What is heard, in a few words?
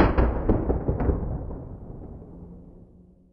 shed, Ticks, Misc Percussion